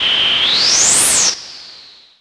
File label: NOIZ SYN; WATERFN